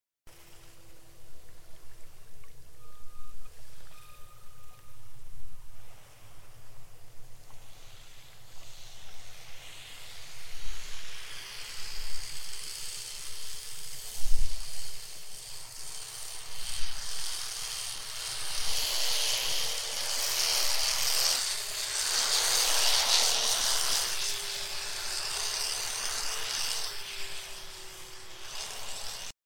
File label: boat